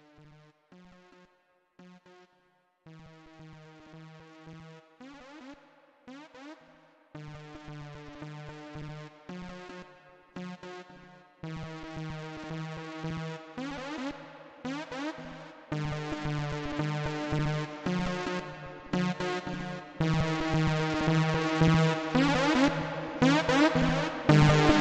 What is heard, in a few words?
song super slowed reverb